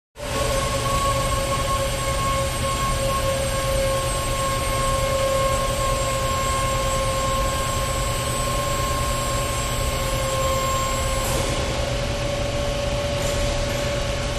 Warehouse Background 3; Machine Motor / Fan Noise; With High Pitched Servo Lifting, Medium Perspective